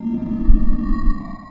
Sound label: monster; ゆめ2っき